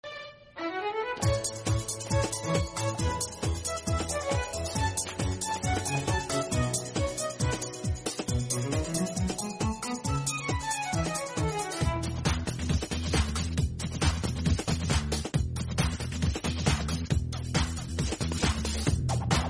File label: break dance music ringtone